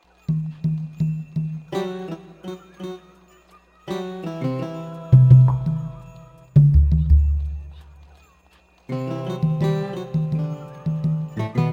Mandolin; Morpheus

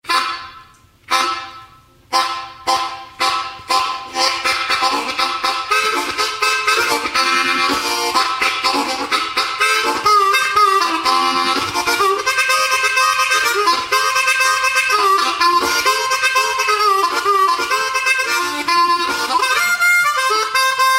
Harp Attack; gamerip; Railroad Tycoon 3

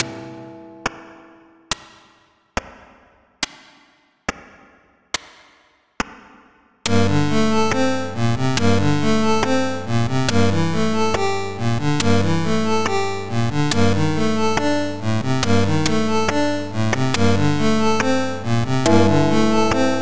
Tick Tock Tower; Adventure in the Tower of Flight

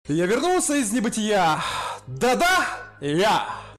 SFX Resurrect; Escape from Russia: Mobilization

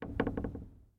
Creak Wood

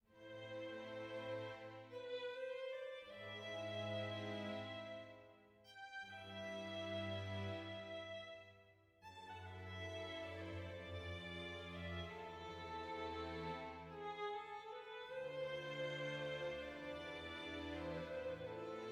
sad soft

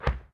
explosion lig

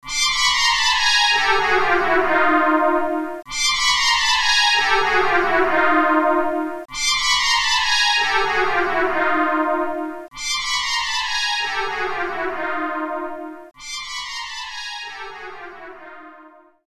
Sound Lose; Discovery Kids Puppy Playtime; NDS

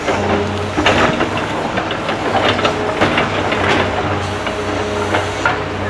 construction loop; Ringling Bros. and Barnum & Bailey; Wii

